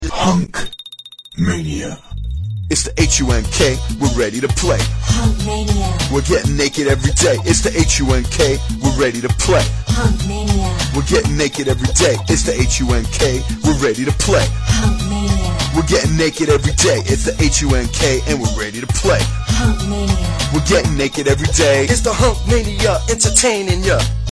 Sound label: ringtone